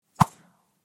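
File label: spear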